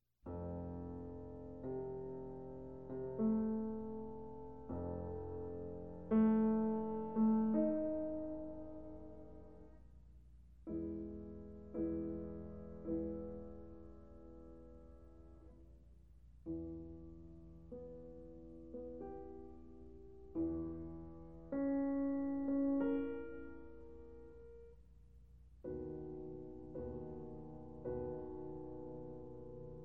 Piano Sonata In C Major, Introduzione, Adagio Molto; Caio Pagano; Beethoven